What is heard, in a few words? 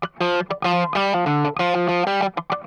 I INSIST, Funk Guitar; 90 s Sample CDs; Zero G